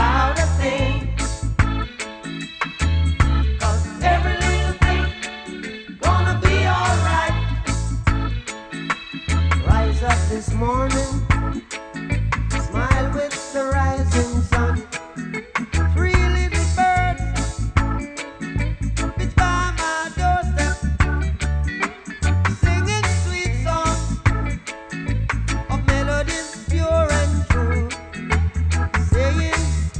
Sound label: reggae